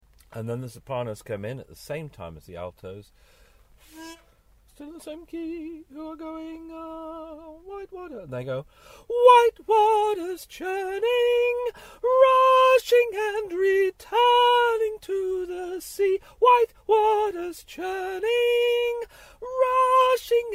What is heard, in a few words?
Soprano